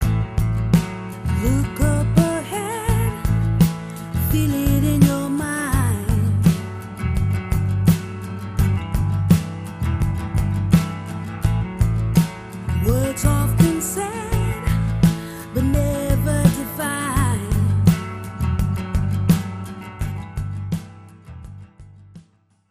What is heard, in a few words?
acoust